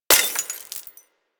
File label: Bullet Impact Debris Glass; Warframe; Weapons